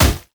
q spell bounce; LoL; ziggs poolparty

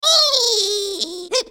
Maokai laugh

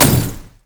barrell roll boom; LoL; classygragas

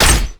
basicattack; Vayne

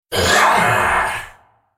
Droid spellcast effort; Khazix; Character Voices; English

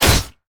basic attack sword; rengar

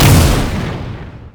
rocket jump; rocket tristana

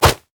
sad mummy bandage toss; LoL; amumu

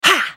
spell cast effort, en US

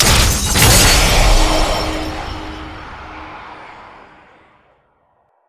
alter unlock; LoL; altars

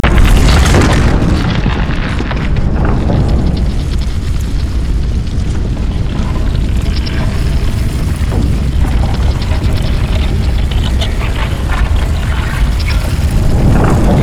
stair extend; odin

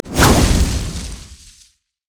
javelin toss; nidalee base

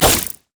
caustic spittle; LoL; kogmaw

